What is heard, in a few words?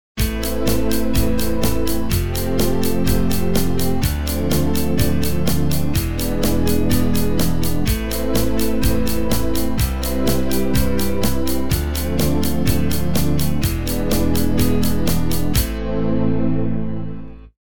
short arrangement